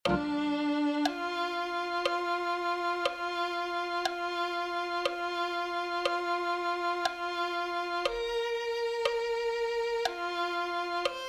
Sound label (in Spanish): violin2